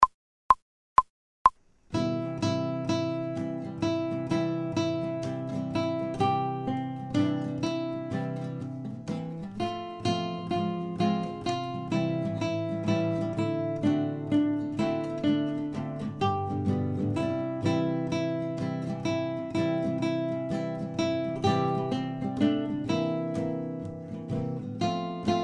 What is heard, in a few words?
Jingle Bells fast, without metronome